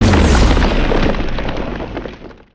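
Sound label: Earth Tornado End Blast; Spinjitsu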